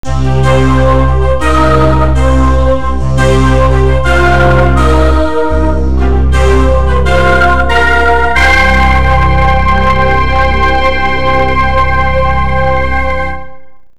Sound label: enter; SoftimageDG